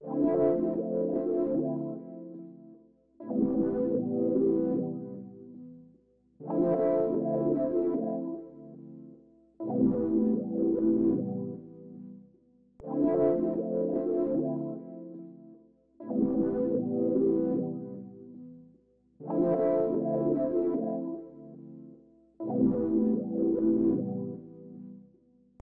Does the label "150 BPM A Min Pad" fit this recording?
Yes